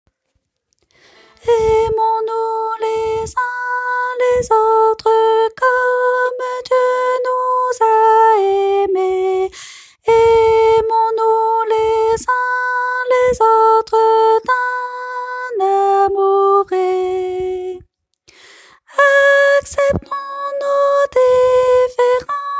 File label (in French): ténor; 165 – Aimons-nous les uns les autres comme Dieu nous a aimés.